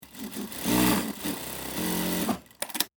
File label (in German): Naehmaschine